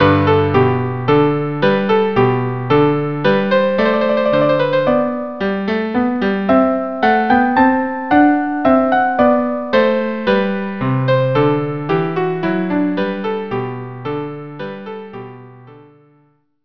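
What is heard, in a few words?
violin cello; Alcina Minuet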